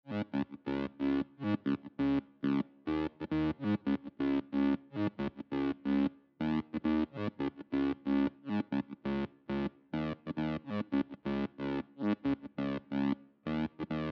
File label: Mid Bass